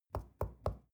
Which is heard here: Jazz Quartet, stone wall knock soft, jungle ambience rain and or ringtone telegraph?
stone wall knock soft